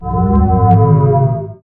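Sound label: bass tone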